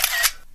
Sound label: iphone camera shutter fast